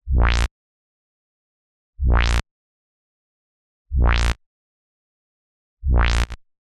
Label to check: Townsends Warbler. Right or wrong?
Wrong